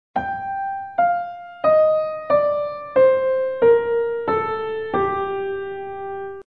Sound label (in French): Mineur melodique descendant